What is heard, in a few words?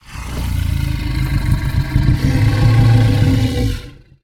struggle